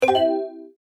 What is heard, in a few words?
notification